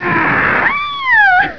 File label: scream